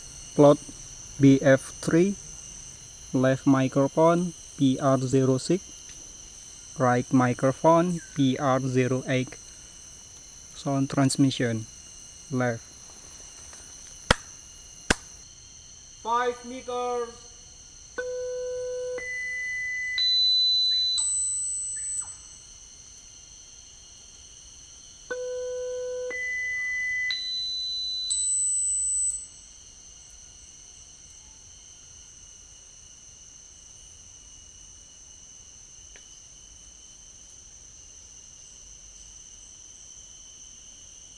birds

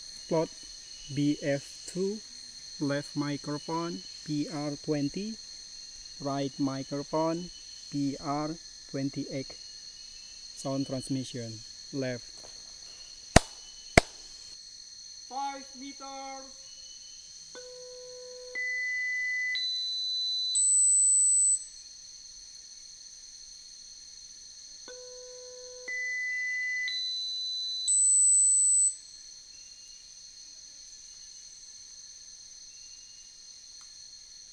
birds

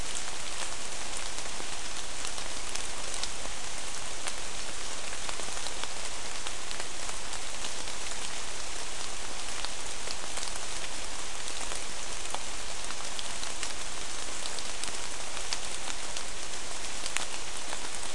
mainly rain

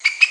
Phanerel electromontis

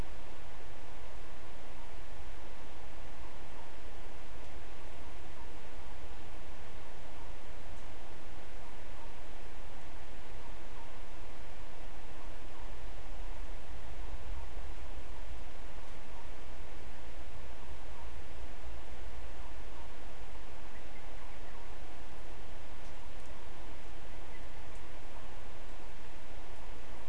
no obvious biophony

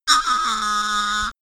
Croak

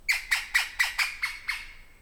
Ppallescens

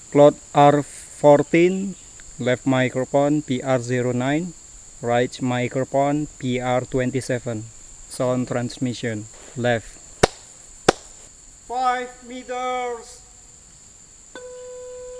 birds

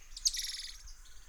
illigeri, Saguinus illigeri

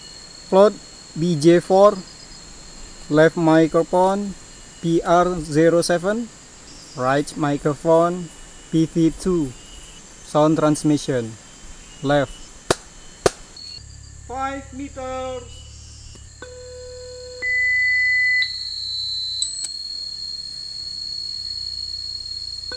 birds

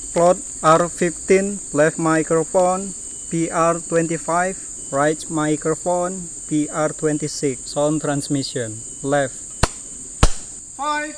birds

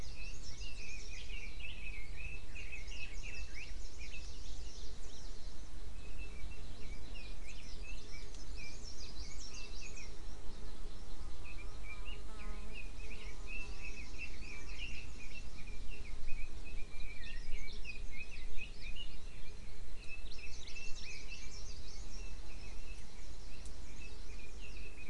mainly bird cluster

